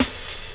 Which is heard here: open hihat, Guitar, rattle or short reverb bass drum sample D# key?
open hihat